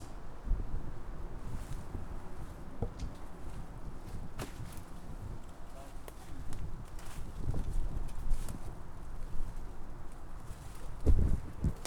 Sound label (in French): Foret en bordure de route; campus universitaire de la bouloie